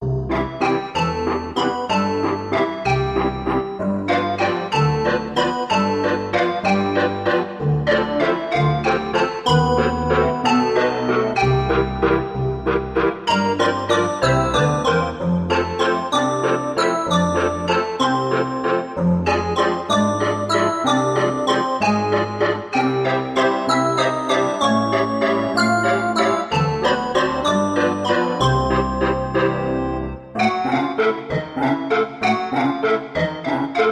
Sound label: Organ Carousel Theme